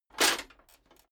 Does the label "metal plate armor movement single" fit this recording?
Yes